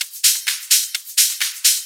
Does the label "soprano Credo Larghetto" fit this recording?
No